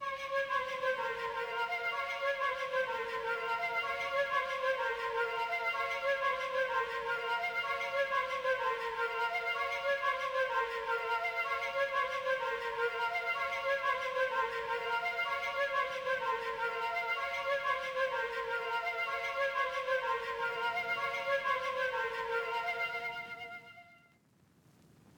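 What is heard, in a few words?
126 BPM A# Min Shakuhachi; Cymatics; Slime; Destiny Gold Expansion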